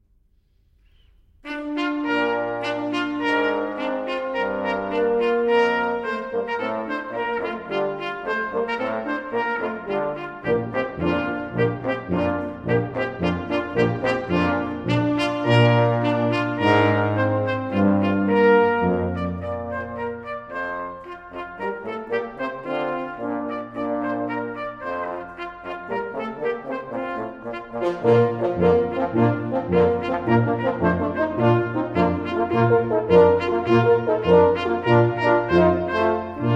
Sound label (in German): Blech; Wenn die Instrumente klingen